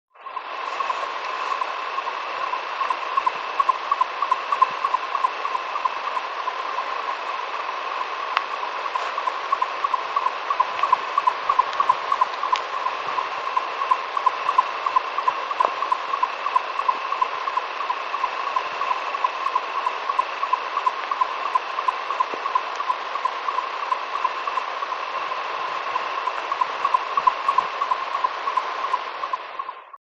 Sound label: GOLDEN NIGHTJAR Oued Jenna